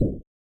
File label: Bump; super mario excitebike